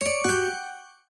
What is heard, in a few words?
Tennis